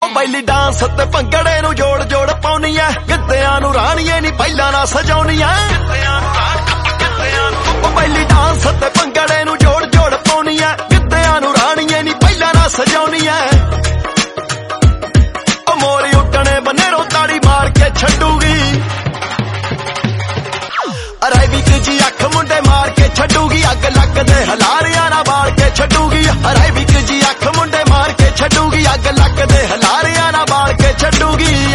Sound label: Arabic tone